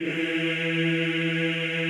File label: EEH E2, Extended Classical Choir; Best Service